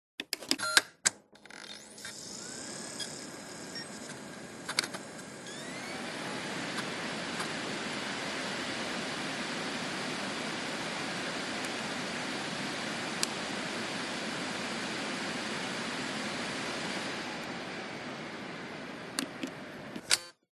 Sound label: technology video game nintendo wii console on disc spin off; zapsplat